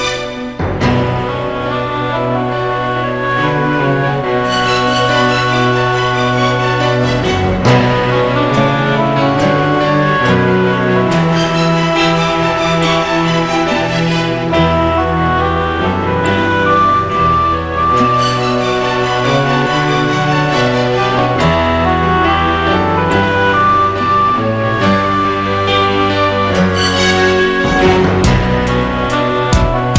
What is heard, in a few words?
Ringtone